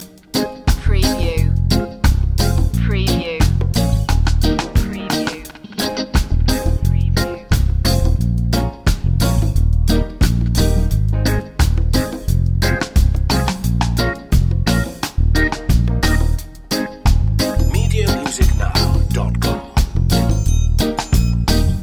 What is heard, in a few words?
soul of reggae loop